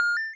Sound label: heal; ReisenYoumuOuting